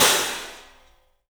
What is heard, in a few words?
CYFX, EFFECT CYMB; 3D Sound; 3D Drums